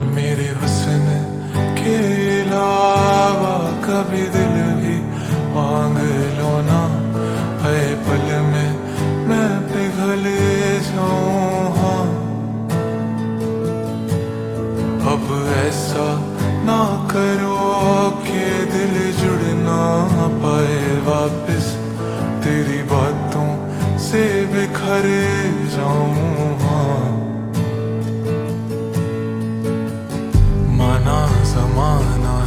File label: Ringtone Slowed Reverb